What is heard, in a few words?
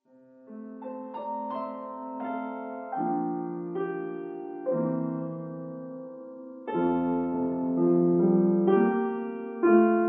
Piano; Larice Music; Nearer My God to Thee